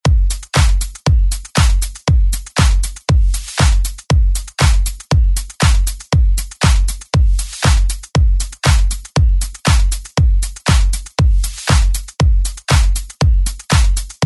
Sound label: 80s Re Drum 118Bpm